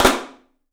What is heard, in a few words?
PICSNF, 3D Drums, PICOLO FLAM; 3D Sound